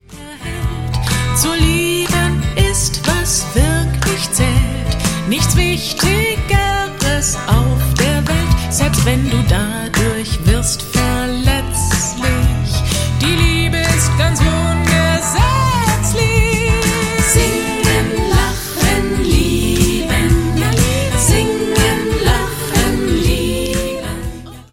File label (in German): Singen Lachen Lieben